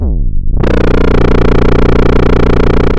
Sound of a chainsaw

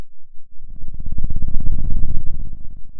Sound of a dinosaur

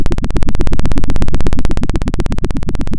Sound of a helicopter

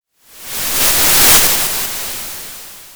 Sound of waves crashing on a shore

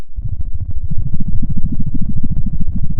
pop, Sound of a dinosaur

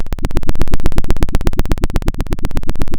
pop, Sound of a helicopter

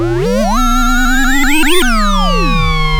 pop

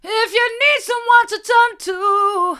hard, vocal

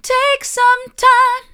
take some time mid, vocal

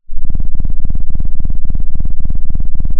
pop, Sound of a cat purring

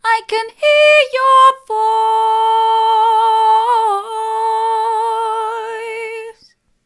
i can hear your voice mid, vocal

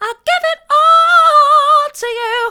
mid, vocal

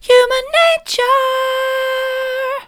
human nature soft, vocal

